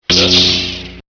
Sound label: Igniting Light Saber; Star Wars; Star Wars Misc